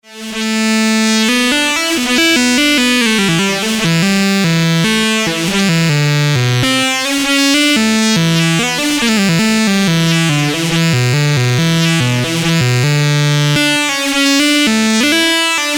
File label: vactrol wasp, synth